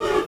MOV; Total Control - Action Cutz; COMBAT

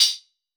Crisp Chuf, Hats; Airwindows

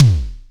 BDRUM, Simmons SDS5

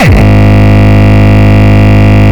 Longkick, kicks

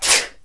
NOISE, CLASSIC VOX; Best Service; Hallelujah

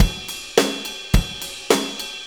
4-4 Loop, Drum Loops, Full Kit Loops

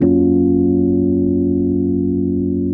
RHODES; Houseworx

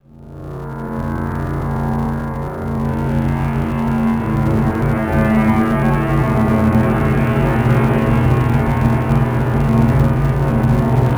Ghost Orchestra; Sonic Foundry (Sony Creative Software); Loop Noir; Session 12 - Otherworld Journey

